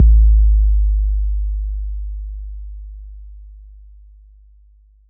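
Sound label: bass drum 808 Tube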